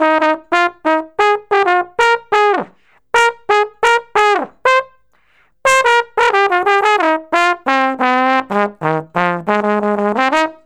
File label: Trombone Solo; Traditional; Sonic Foundry (Sony Creative Software); Jazz Solos and Sections